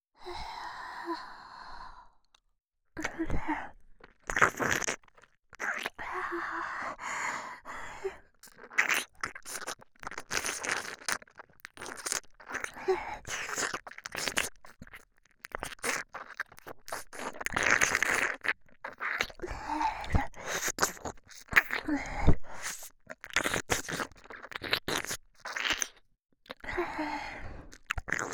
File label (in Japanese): 耳舐めリピートボイス右耳Ver; 【NTR妻水瀬苺】